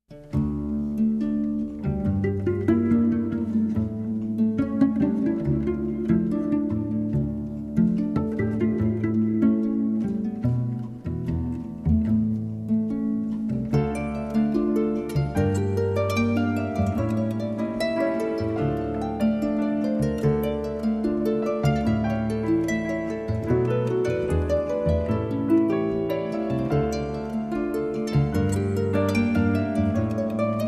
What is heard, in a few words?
Night Groove